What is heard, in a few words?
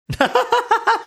funny laugh